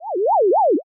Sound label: flashback out